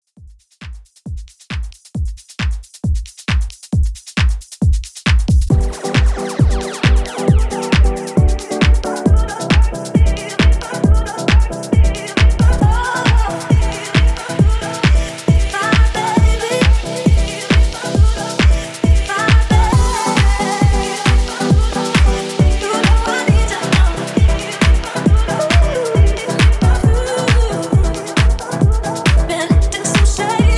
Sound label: Club Extended 135Bpm; djyan